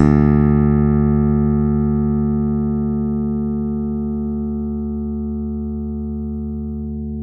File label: open D2, Bass; Masterbits CLIMAX 7 - Bass; KEN SMITH BS